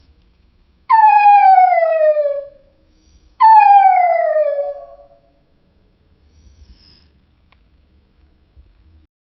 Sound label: sad poodle whine